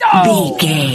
voice male hurt pain

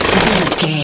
gun auto barrel spin fast stop

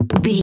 footstep woodland

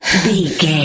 voice female breath run

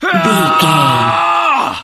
voice male battle shout

